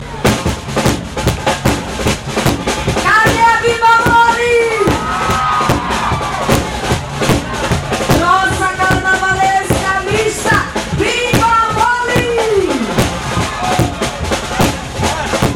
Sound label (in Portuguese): orquestra popular do recife banda apresentacao carnavel